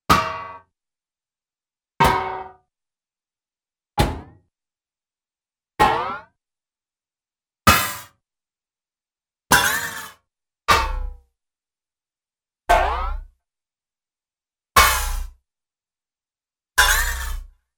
Single Various, Thin, Small Metallic Impacts, cartoon; Hollywood Edge; Cartoon Trax